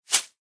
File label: electric transition super quick; swoosh censor muter